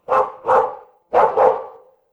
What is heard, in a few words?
animal dog bark